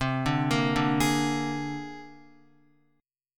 C 7th Suspended 2nd Sharp 5th C down Guitar; C7sus2#5 arpeggiated

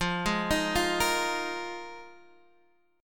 F Minor 11th, guitar chord

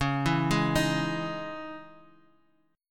C sharp Minor Add 9th C sharp, chord; C#madd9; arpeggiated; JGuitar

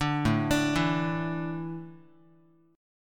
A Suspended 4th, Guitar; Asus4 arpeggiated; JGuitar

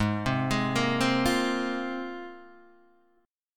G sharp 6th Add 9th G sharp Guitar DADGAD

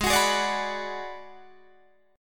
Ab Minor 7th Flat 5th Ab, down, Guitar, Drop D

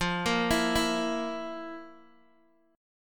F Major 7th Suspended 4th, Guitar, Open G

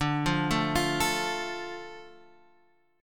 D Minor D Guitar Standard; arpeggiated; JGuitar

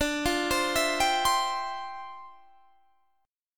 D Minor 11th D down Guitar Standard; Dm11 arpeggiated; JGuitar

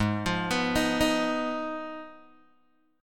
Ab Minor 6th Ab, down, Guitar, Open G

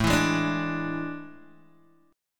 A Major 7th Suspended 4th, Guitar, Open G

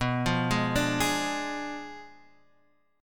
B Diminished 7th B Guitar Half Step Down

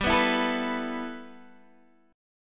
G 6th Add 9th, Guitar, Open D

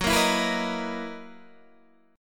F sharp 13th F sharp down Guitar DADGAD

